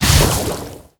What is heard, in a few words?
beast lava ball splash